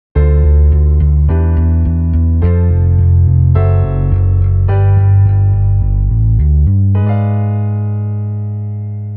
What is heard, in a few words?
passing halfdim ii